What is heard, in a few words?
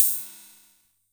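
Cymbal, Korg KR-55B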